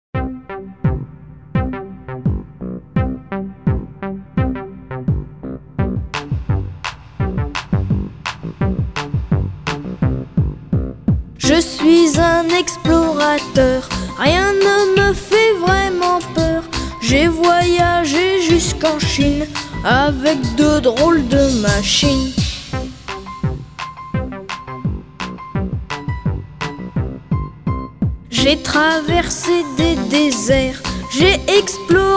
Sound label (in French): CHANSON; Alex et Zoé 3